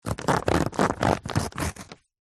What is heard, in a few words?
foley paper sheet cut with knife; zapsplat